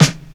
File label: good acoustic snare D key; Mono; snare drum samples